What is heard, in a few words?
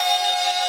synth main